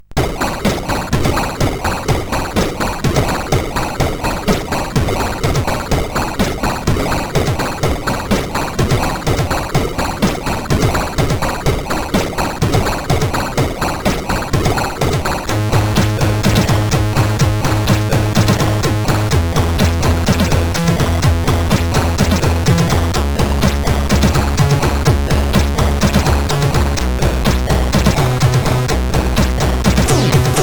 tune, sid, CSG8580R5

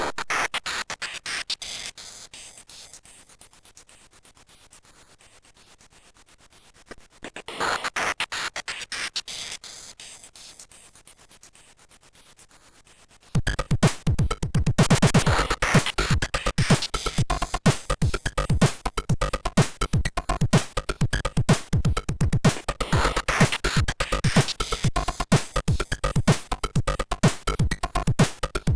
Drums, MOS6581R3

